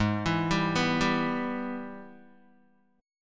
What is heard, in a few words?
Ab Minor Major 7th Sharp 5th, down, Guitar; arpeggiated; JGuitar